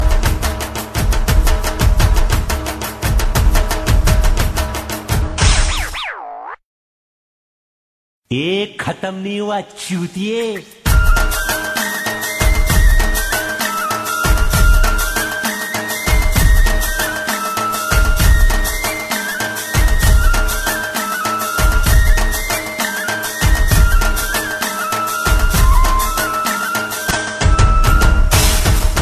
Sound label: Dhol; Hyderabadi